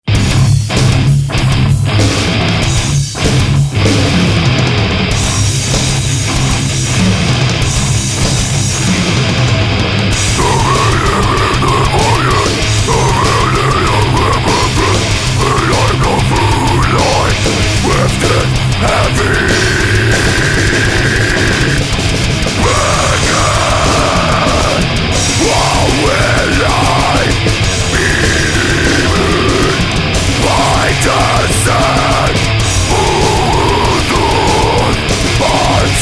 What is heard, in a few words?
metal mix